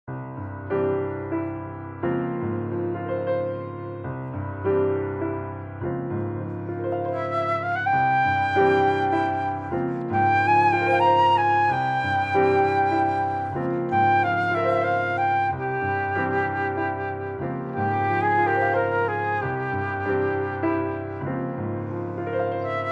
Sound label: Sonata for Flute and Piano; Andrew Gilpin